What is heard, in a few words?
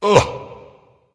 ugh dragon; fafnir